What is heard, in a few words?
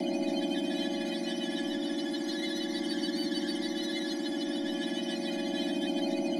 Scroll Loop; Marv